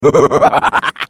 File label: laugh; Defective Turret; sp sabotage factory